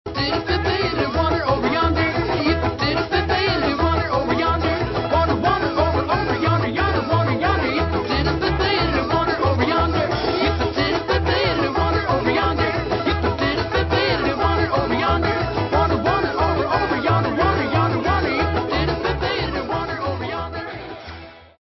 theme song; wander over yonder

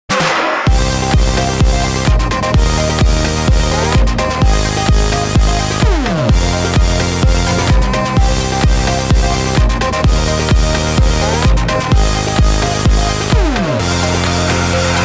superbass

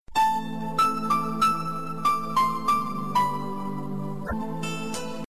piano message; ringtones; sms

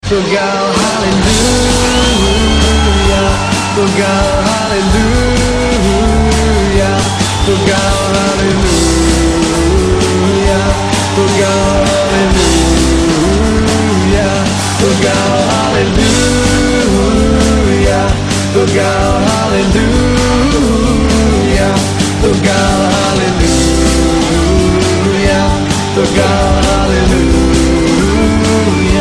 halleluiah; christian

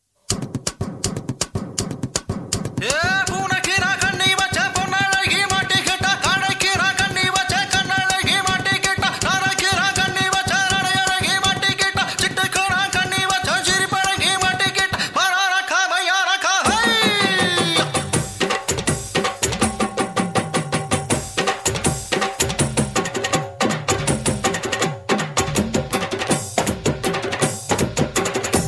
tamil gana

